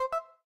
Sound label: request